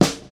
Subby Snare Sound F# Key, steel snare drum sound tuned to the F# note